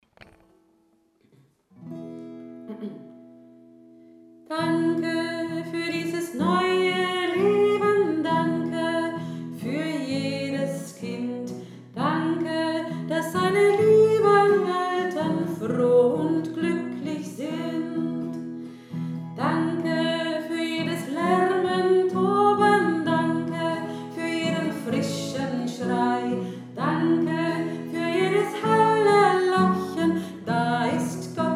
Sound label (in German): dankeslied